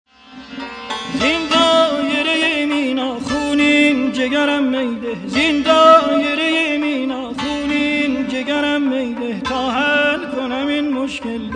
Tasnif

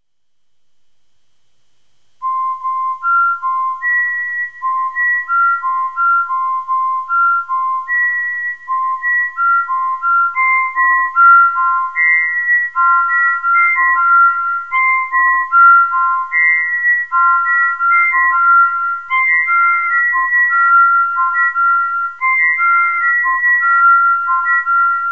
bird

